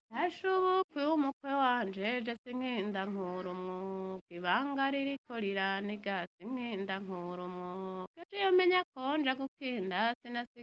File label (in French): chant des fetiches deuxieme version